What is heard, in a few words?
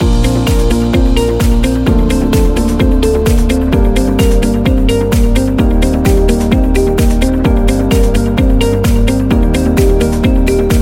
Positive Loop